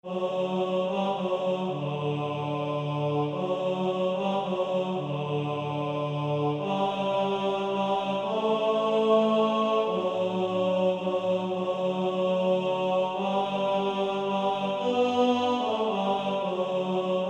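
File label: practice music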